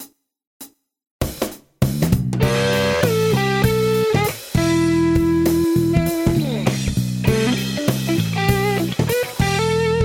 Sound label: intro solo